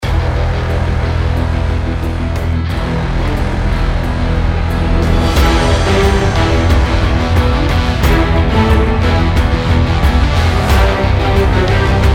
Maintheme Hybrid; Tencent Hex

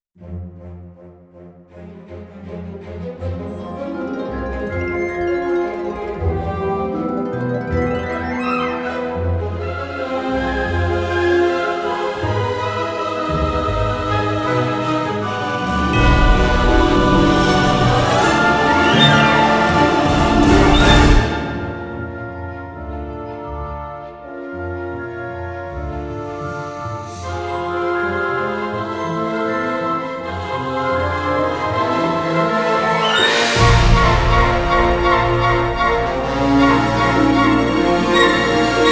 Magic Sample; Hollywoodwinds

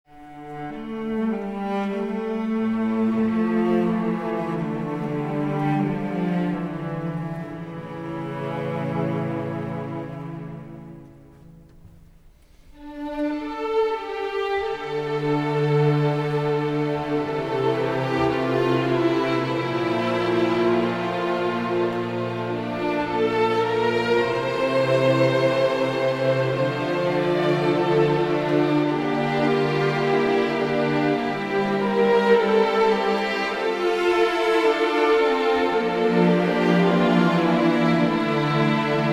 Credits Orchestral; Gothic 4

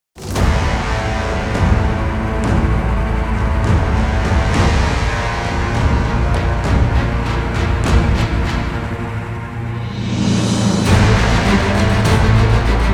Action Orchestral; Thrones of Britannia

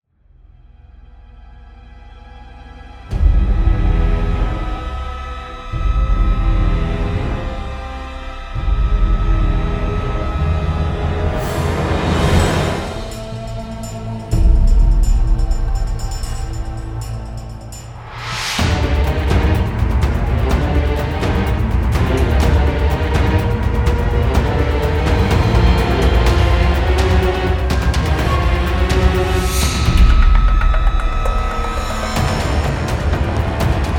Cinematic Orchestral Hybrid; MKX

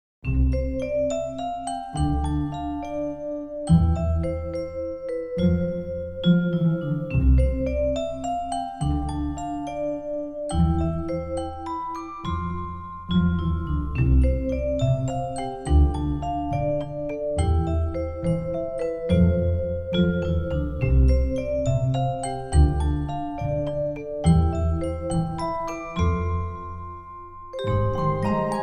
Maintheme Child Orchestra; Wimmelbild

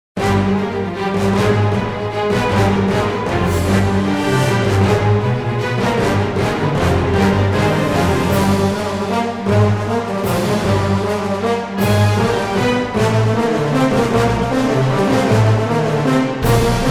Battle Orchestral Brass Ensemble; NI

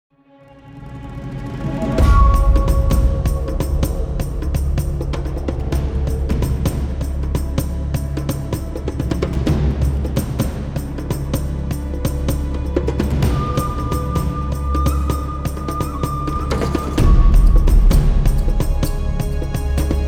Action Tribal Percussions; Risen 2

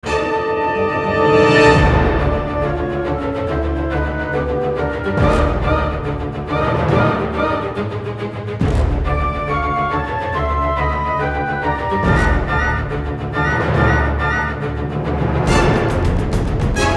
Action Sample; Jack Keane

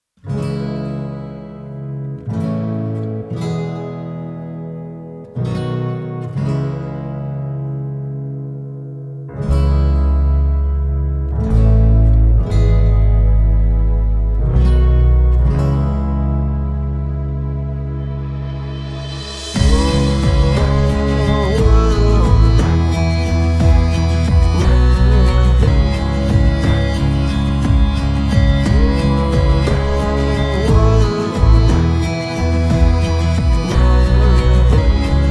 Ballad Pop

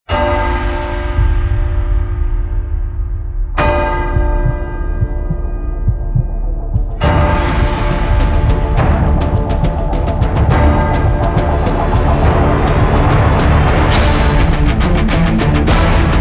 TV Theme Orchestral Hybrid Outro; FOX UFC

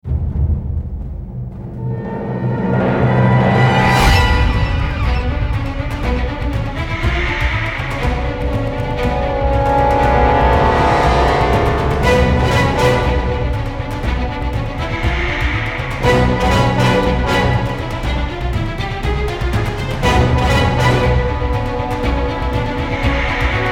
Action Orchestral; Crysis 2

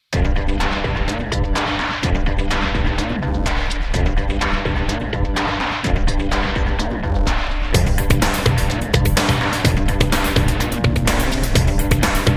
Rock Pop

